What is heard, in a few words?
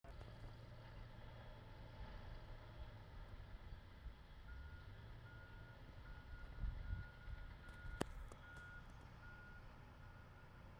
Equipment Running 800 ft; planning and zoning